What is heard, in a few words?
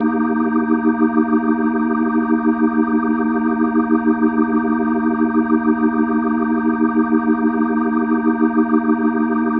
Organ D# Maj; Ambient Cinematic; Organ Licks